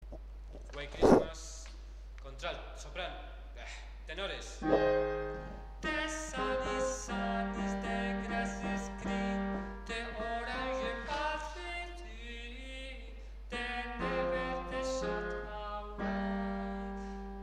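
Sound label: tenor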